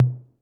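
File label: 808 mid tom; Crypton - DrumBase5000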